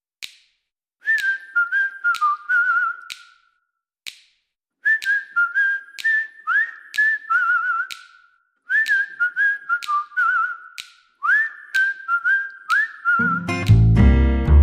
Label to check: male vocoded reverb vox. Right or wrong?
Wrong